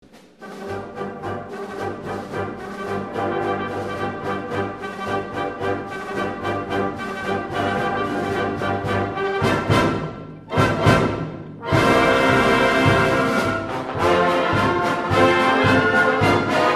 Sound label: toy trumpet